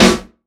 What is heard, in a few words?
steel snare drum sound f sharp key